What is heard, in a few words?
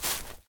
moss step